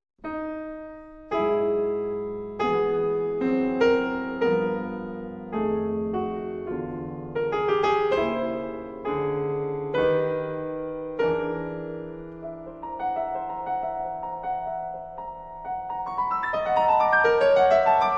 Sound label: Piano; Schubertiana